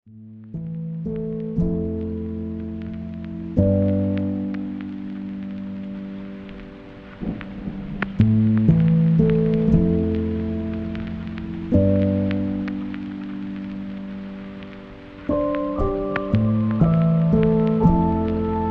White Noise, Lo-Fi

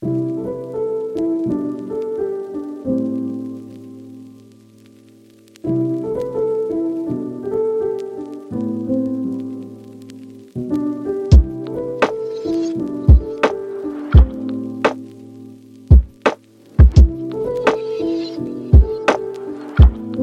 Castle, lofi piano

